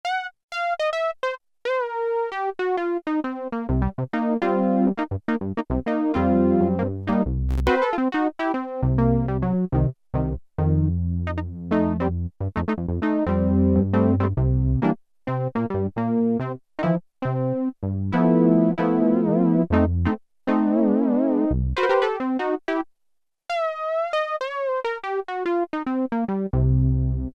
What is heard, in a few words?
FUNK, Oberheim OB-8; Factory Patches; FARR’S FUNK